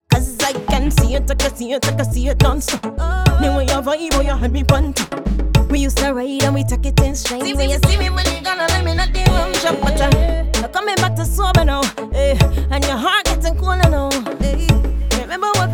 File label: reggae before